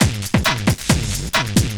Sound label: LOOP, Drum & Bass, 135 BPM